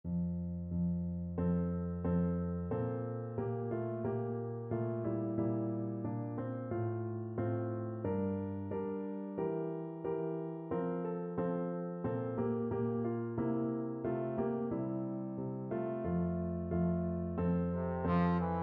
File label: bach bwv106 1st, trombone